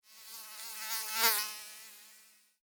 Mosquito Fly By, Mosquito, Fly, By, Wings, Insect, Bug; Mosquitoes; Blastwave; Animals:Insects